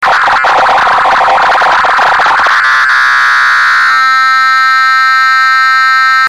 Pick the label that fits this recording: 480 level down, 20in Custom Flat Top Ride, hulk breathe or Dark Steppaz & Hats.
480 level down